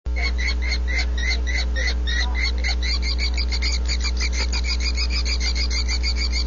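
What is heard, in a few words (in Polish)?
drzemlik, głosy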